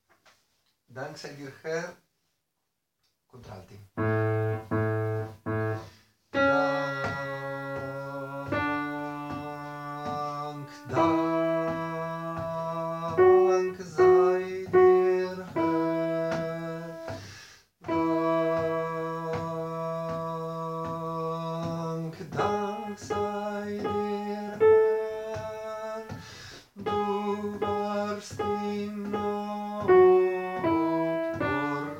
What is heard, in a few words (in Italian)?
contralti; Haendel Dank Sei Dir Herr